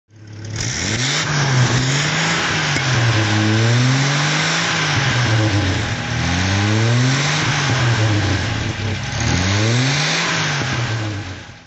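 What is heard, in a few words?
jaguar mkV throttle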